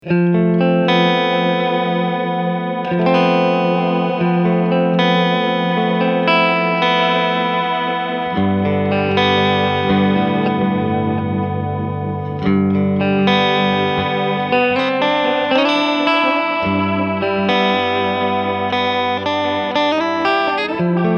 Analog; V1MOD

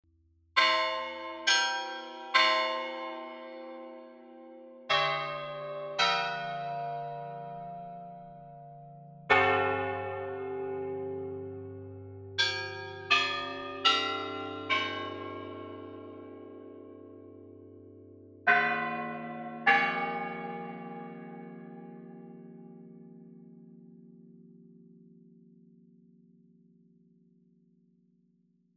Church Bells

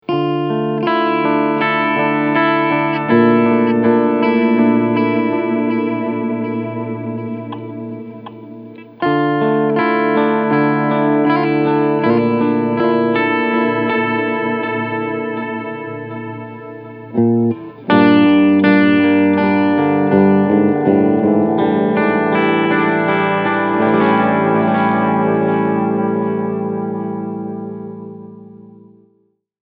LoFi Echo

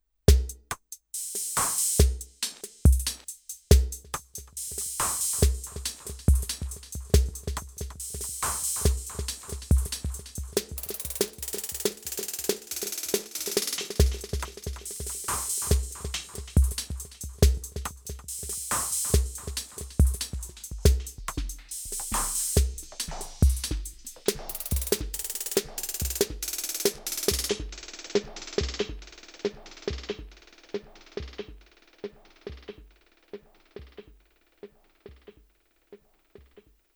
TR 6S

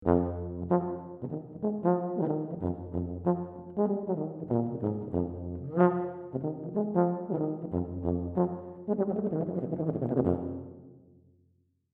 Tuba

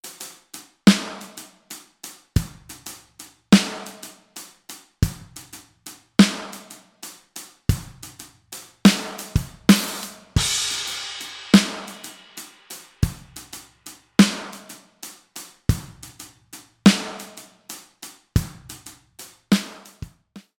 Ambient Busses; BFD Player

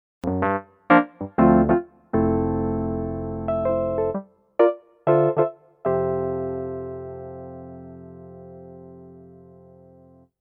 GP300 60s EPiano

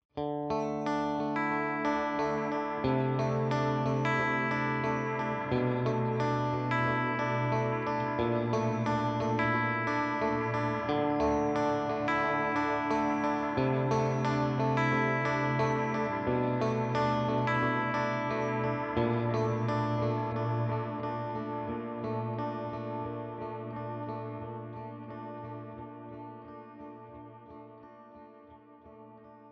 Delay; Adriatic

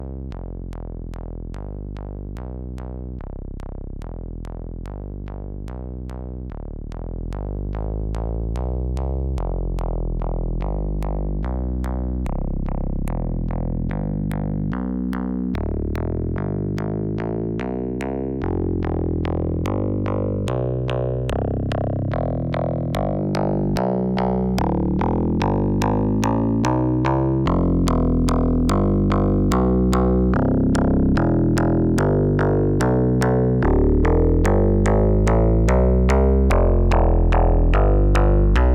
saw fold; cre8audio West Pest

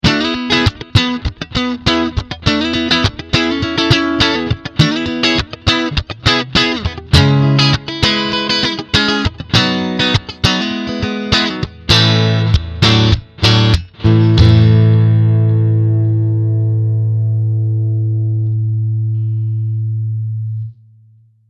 Westcoast

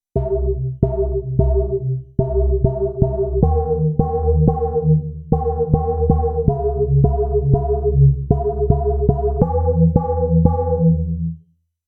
Tube; Sinevibes

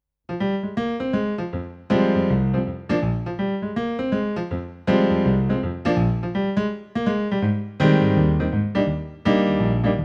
Concert Grand

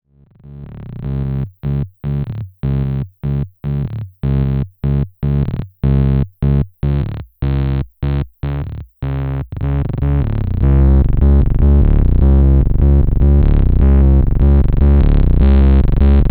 VCO in Kick Trigger Wave Shaper; Quanalog BouBou

